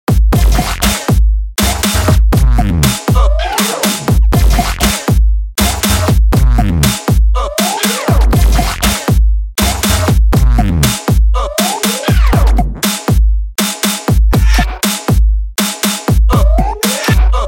Funk

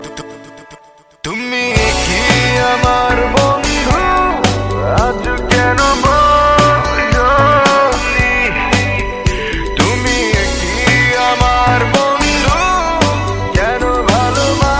Song Tones

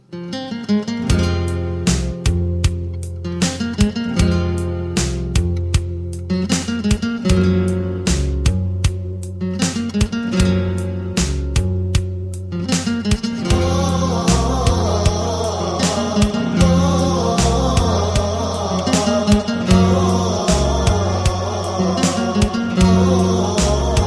Classical Guitar

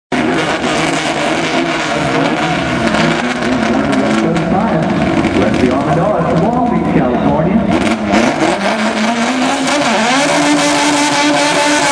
Skyline Gtr Dragster

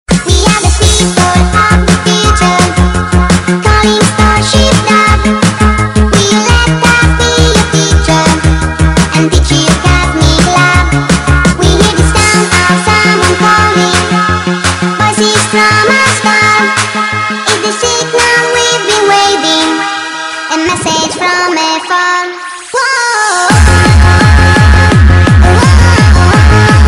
disco

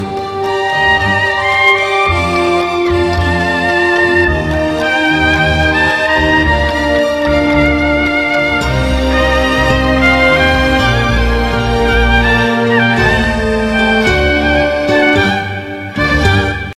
Tango

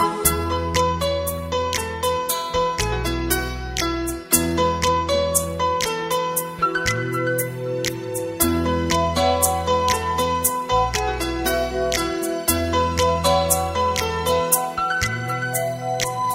Guitar Tune

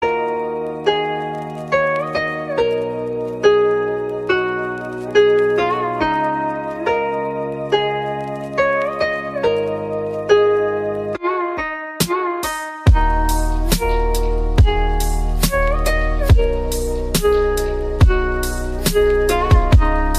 ZEN Japanese

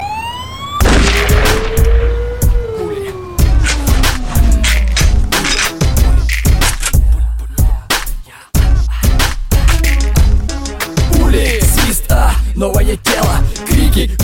Title Song; Puli

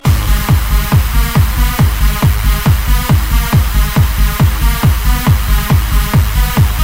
Hard Bass Sms

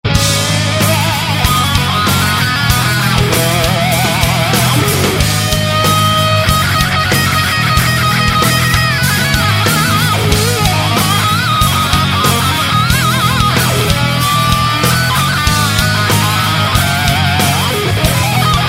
Guitar Solo

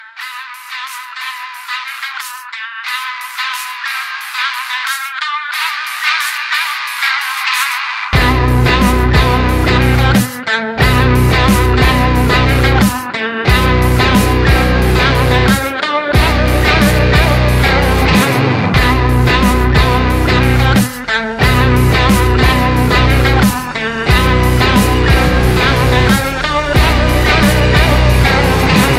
Ringtone; Kabali

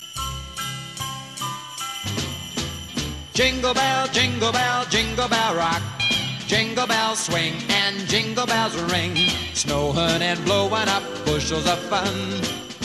Bell Rock

